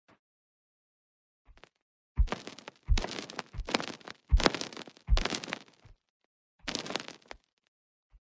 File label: glass texture